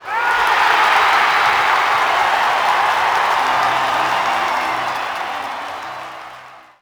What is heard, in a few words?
cheer, crowd